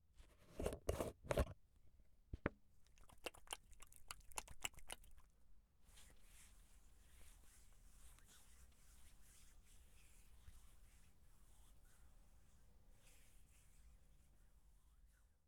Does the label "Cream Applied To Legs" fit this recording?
Yes